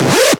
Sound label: Synths C#; Vengeance Samples